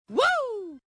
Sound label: woo